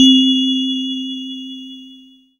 SYN K-2000, Roland

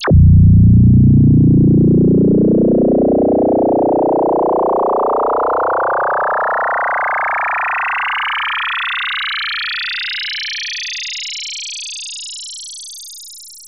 JUNO 106; Masterbits; Vintage Voltage; SEQ-FX

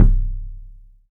THUD KICK; 3D Drums

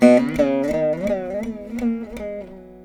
VEENA; Spectrasonics; Heart of Asia

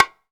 BNGO, BONGO; AKAI S-Series CD-ROM Sound Library VOL-5

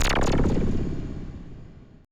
AMBI FX

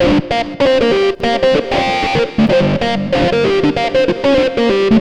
xtra lead

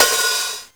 R50 Hat O, Kawai Drums

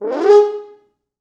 4 FrHorns FXMOD, G#3; East West; Quantum Leap Horns Sax

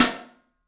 MARCH, 3D Drums; 3D Sound; MARCH XTREM

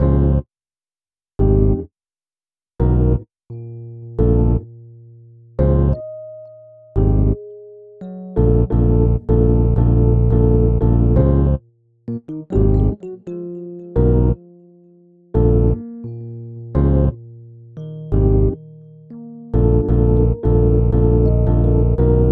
Melody

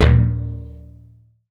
Tin Tray, household rhythm samples hits

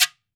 GUIRO, Cuba

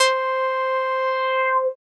c, Euro Style, Dance Synths

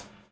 DOWN, DOWN KIT 78

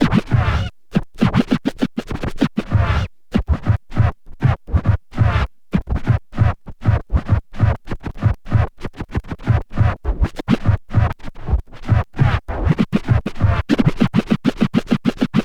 scratch long, Vinyl Attack, scratches

